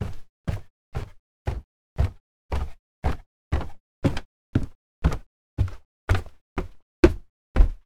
descending stairs in house

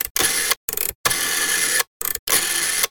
rotary phone dial being rotated

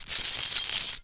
sickle and chain

